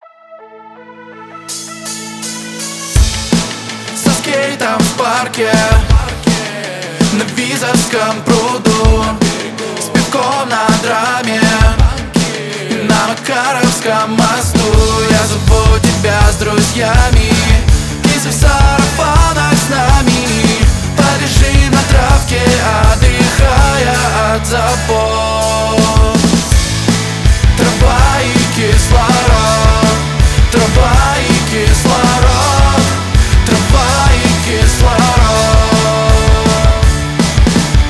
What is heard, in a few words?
pop pank; russkaja pop muzyka